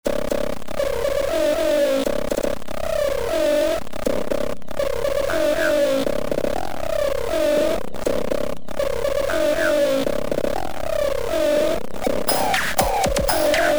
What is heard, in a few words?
Distortion and Chorus; plasticdissolve